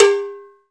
CowBells

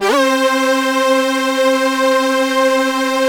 lead synth

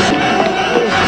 Odd Loop in 6

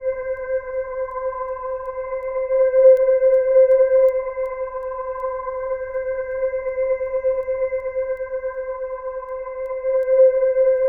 C4, Synth Leads; 90 s Sample CDs; NiceBeats (NiceBytes); Studioline 09

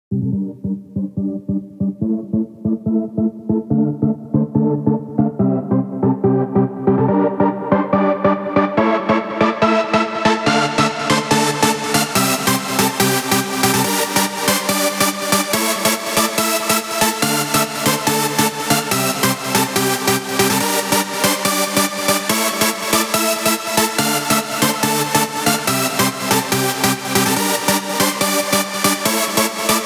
PWM Kit 142BPM Chorus - Lead WET, Gmin; Vengeance - Essential Clubsounds Vol.5; Melody & Synth

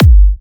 G, Kicks; markus hakala

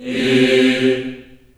C3, Choir

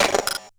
Bent Odd, glitch kit

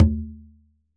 FIST, Congas

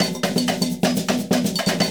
Percussion Loops, Brazilian; Houseworx

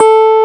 PUNCHREEDS; Wizoo - Powered DX

